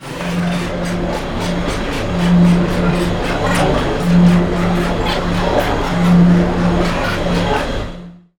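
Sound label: ALIEN SHIP; AKAI Anime Sound FX; SCI FI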